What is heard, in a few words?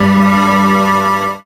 robot sings, synths; Notes